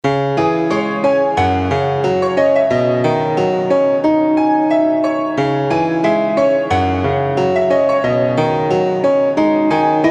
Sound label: Instrumental